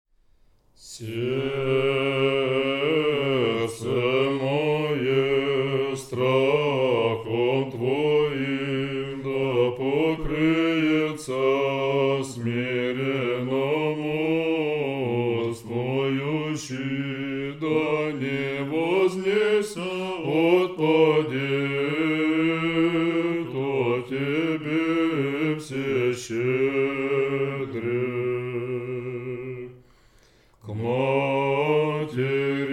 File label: third antiphon tone 3